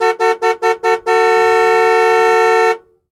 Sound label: Jeep Cherokee Horn Honks, 5 Short, 1 Long